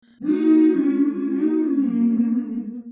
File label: 83 humming harmony